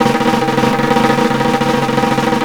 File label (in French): caisse claire